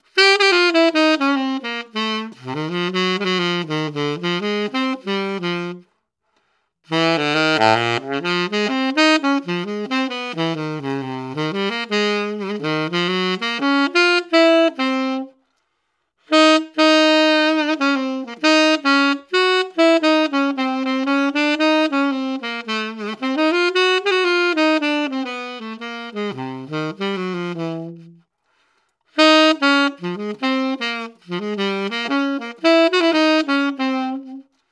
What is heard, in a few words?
Cane Slow